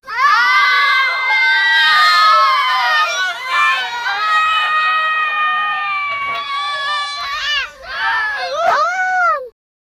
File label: furry kids cheering and h, children